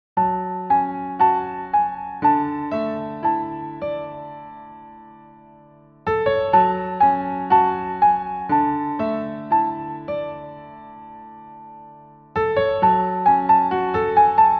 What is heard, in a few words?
piano